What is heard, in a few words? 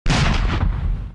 Raketa Explosives